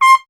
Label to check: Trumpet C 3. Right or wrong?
Right